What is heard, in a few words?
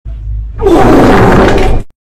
Fart Meme Sound